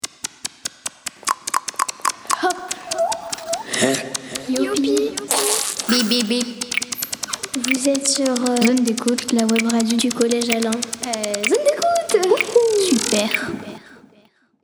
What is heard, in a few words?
jingle